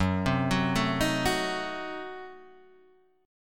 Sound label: F sharp Diminished 7th F sharp Guitar Standard; arpeggiated; 2,3,4,2,4,2